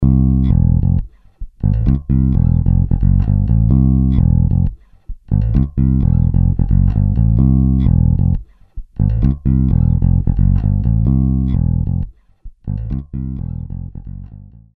Groovy Electric Bass; Apple GarageBand 2; Apple Loops

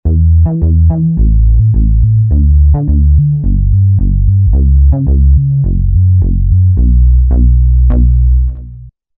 Feel the BASS, Roland V-Synth; Factory Patches

